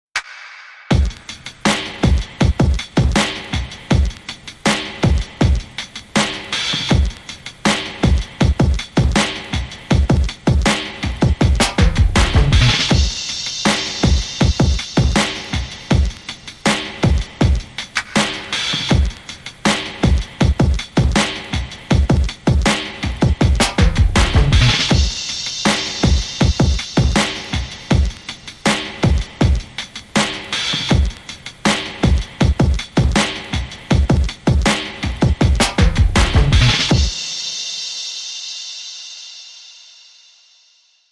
Beat

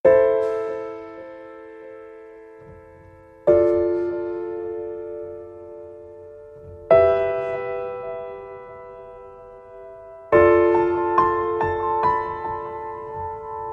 Piano Only

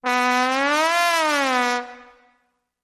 Trombone Slow Gliss Up and Down

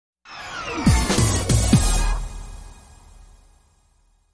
Big Bright Stab

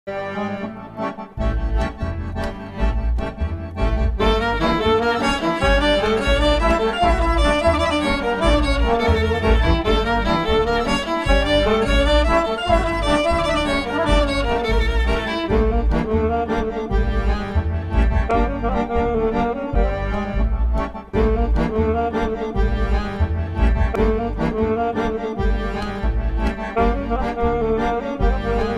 Swing

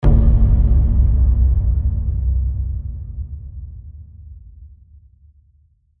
Ethnic Boom

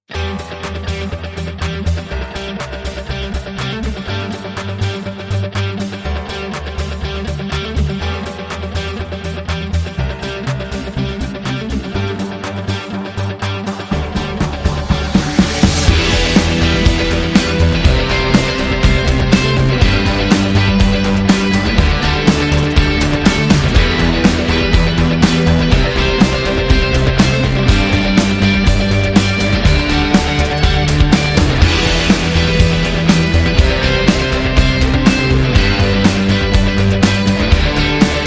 No Lead Guitar and Synth Arpeggiator

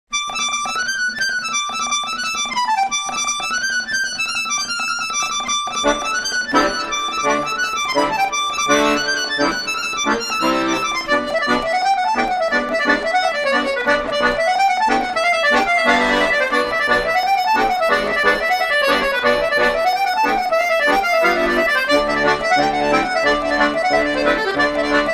Irish Fusion

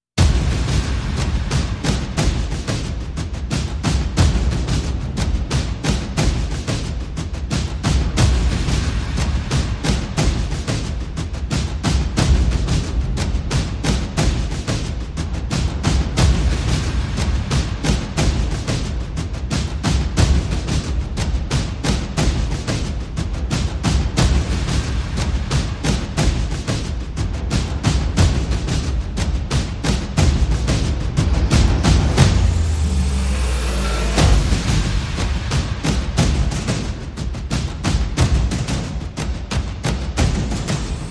Perc and FX

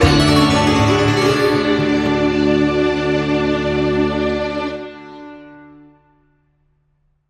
Hit

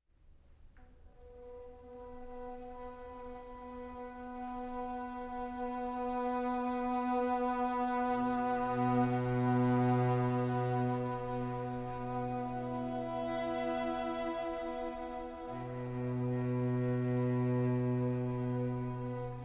Strings

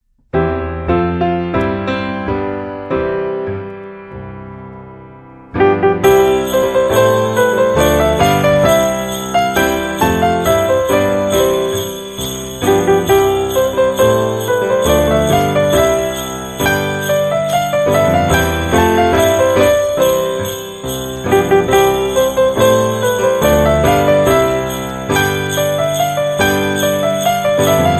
Twelve Days of Christmas

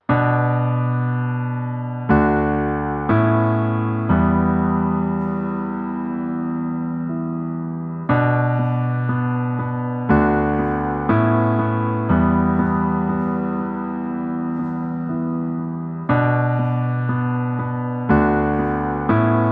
Amazing Life, No Drums

